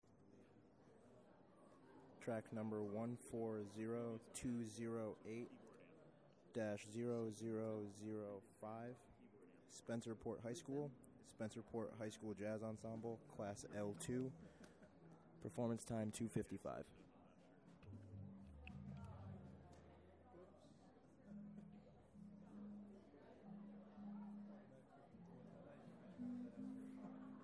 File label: HS Jazz Ensemble; Spencerport